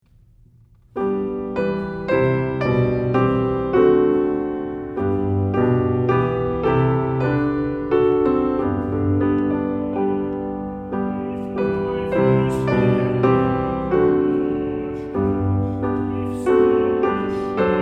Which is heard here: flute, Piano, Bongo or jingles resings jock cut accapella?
Piano